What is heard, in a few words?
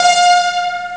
ex voice tune; Amiga Soundtracker Sample Packs